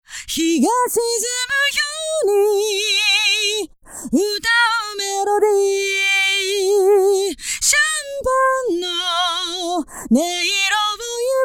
phaser stage 2

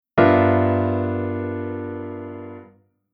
Chord Tension Dominant V7 9 11 13

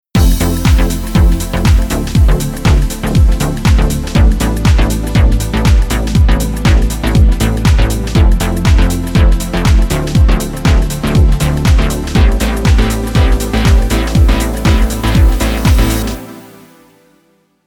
Synth Side Chain Off

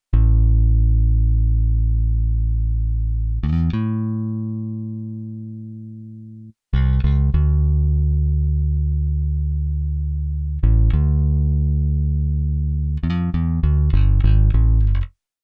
Bass Arr Blues Bass

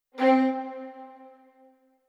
layer

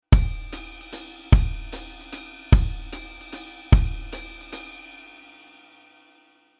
Rhythm Jazz Waltz

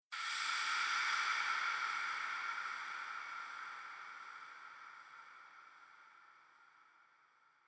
Future Bass FX Hit

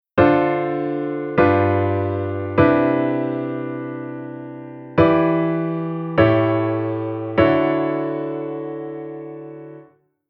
Chord Modulation Direct

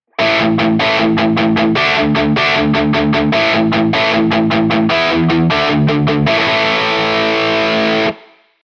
guitar bridge mute

